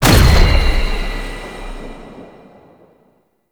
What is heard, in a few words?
Unhallowed Impact; Warframe; Dagath